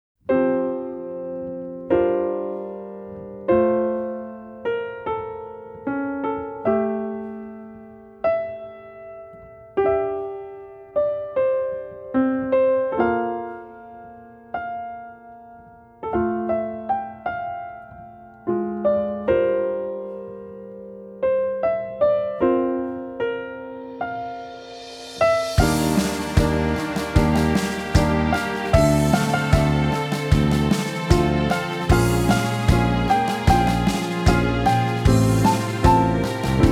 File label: Instrumental